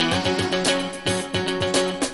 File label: loop